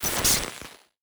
Glitch

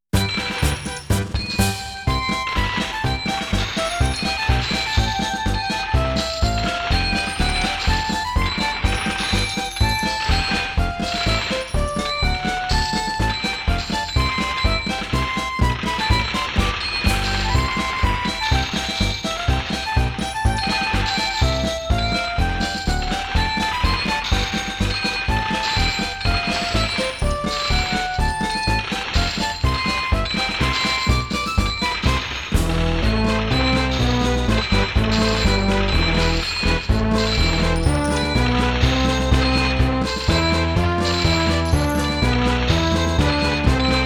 Coin March; Digimon World Digital Card Battle Original Soundtrack

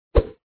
Logo Move; papas pastaria to go; android

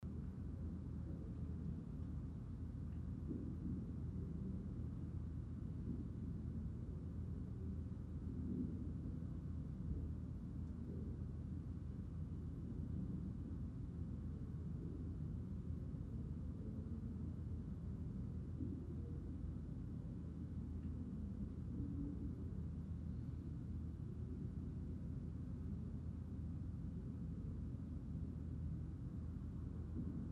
Classroom Ambience

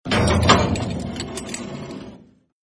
lock sound

